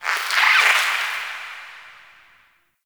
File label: Critter Squeak; Warframe; Ambience; Nokko Colony